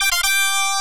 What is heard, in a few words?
Rogue Assist Fanfare; X-Men; Genesis